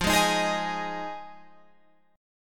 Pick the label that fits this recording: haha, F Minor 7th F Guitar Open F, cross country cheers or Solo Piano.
F Minor 7th F Guitar Open F